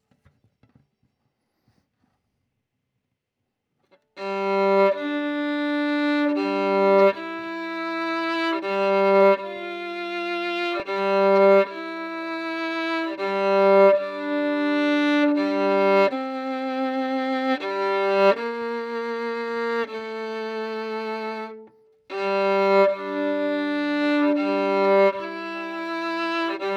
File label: Violin